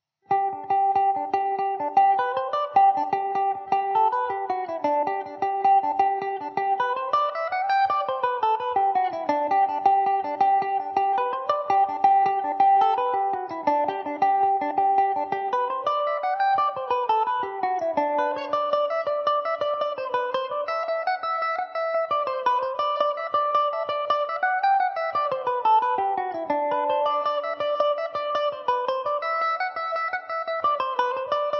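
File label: G major mandobird, irish mandolin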